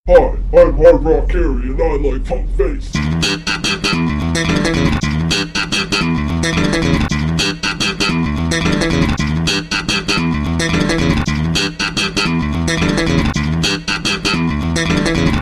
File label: Hard Rock Punk Bass; Hard Rock Harry